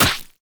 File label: heroes broden birds of prey gryphon attack